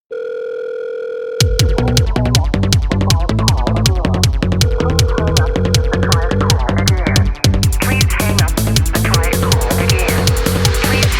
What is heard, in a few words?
Instrumental